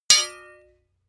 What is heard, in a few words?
hammer hit metal hard